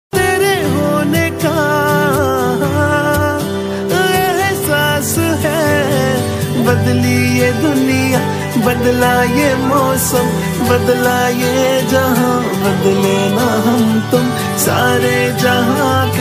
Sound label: sad love